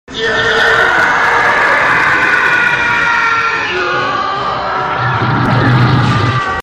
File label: fighter sound; tie